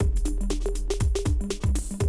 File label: 120, Classic Drum Loops